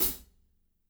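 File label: closed hi-hat, natural kit; Spectrasonics; Burning Grooves CD2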